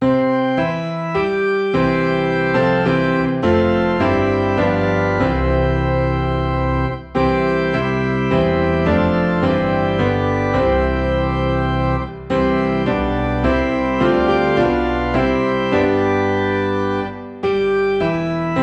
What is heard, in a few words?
Piano and Organ Low in C